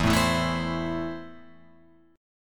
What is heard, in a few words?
E Suspended 4th Sharp 5th, Guitar Open F; Esus4#5 strummed; 4,4,4,4,7,7